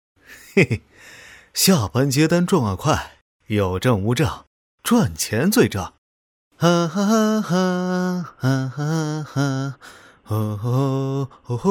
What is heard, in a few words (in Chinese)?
素人角色模仿 男 哼唱 轻松惬意; 年轻质感 素人自然